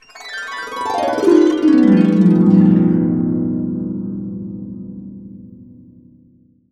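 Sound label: B CASCADE DOWN, Harps; USB Soundscan vol.62; AKAI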